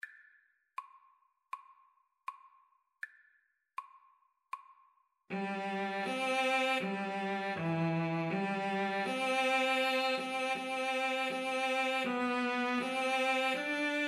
violin cello duet

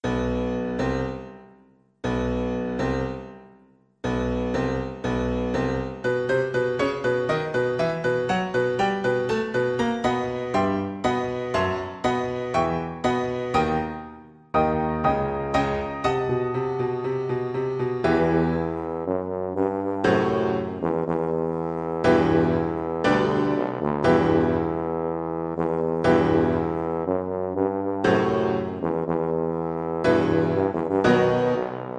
tuba

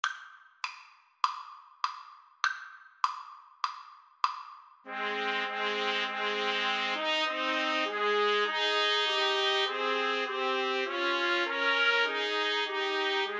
Trumpet Trio

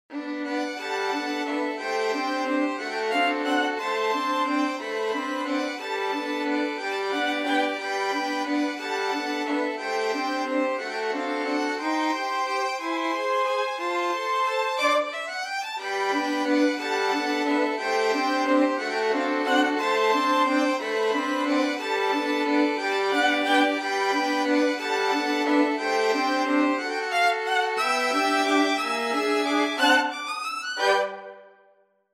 violin ensemble

